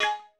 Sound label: Korg 01W Dance Kit; 300 Drum Machines